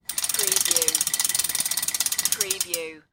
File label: Chain Through Pulley Slow Speed